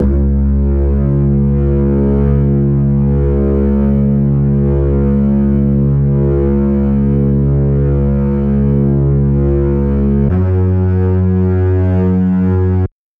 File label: bass